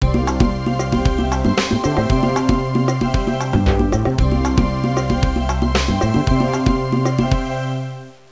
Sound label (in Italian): suonerie contemporanea; musica contemporary